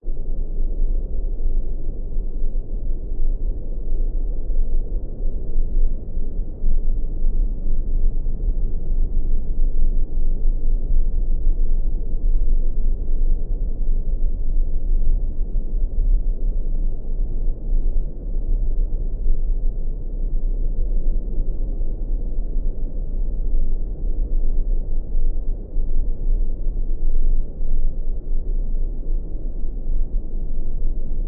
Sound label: arctic wind filtered rumble